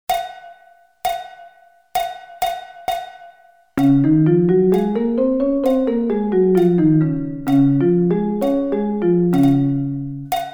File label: Db Scale